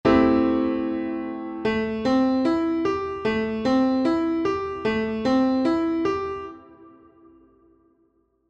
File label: Am7